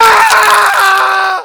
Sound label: scream tank; gesource; mk blockfort